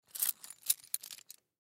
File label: Key Ring Pick Up; Pick Ups; Foley; Blastwave